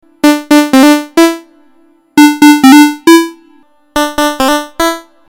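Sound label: saw pulse square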